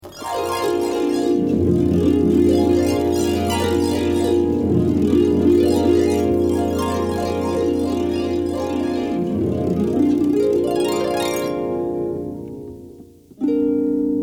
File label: solo Harp